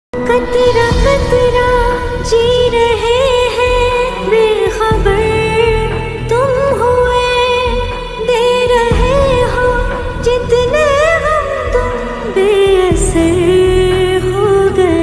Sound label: pakistani ringtone; wehshi OST